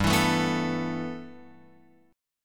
G 6th Add 9th, Guitar, Open F; G6add9 strummed

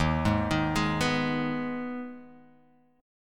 D sharp Suspended 4th Sharp 5th; arpeggiated; JGuitar

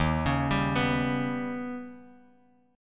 Eb Augmented 7th, Bass Standard; arpeggiated

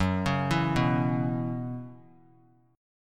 F sharp 7th Suspended 4th; F#7sus4 arpeggiated

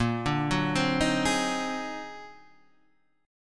Eb Minor Major 13th Bb

